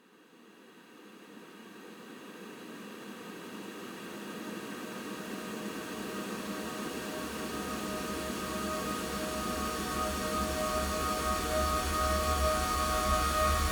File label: 128BPM Cold Chord D#, Melody Fills; 16Bars; Vengeance Samples